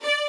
violin

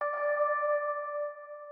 organ

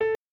piano

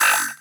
bass3

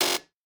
breakbeat

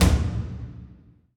drums

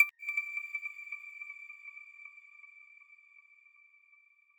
arp

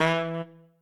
Trumpet melo

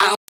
vox

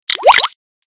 Bubbles, notifications; 三星

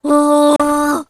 wow D4; 音MAD; 孤独摇滚

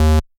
bass

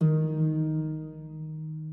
harp

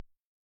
Dr Kick

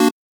Chord

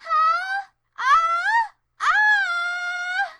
AAAAAAAHH!

